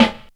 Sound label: SD, Dance Mega Drums; Best Service; SD-HIP